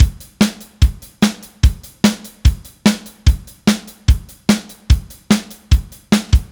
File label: Beat, Indie Rock